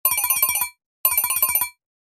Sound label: quick bell, Ringtone; Apple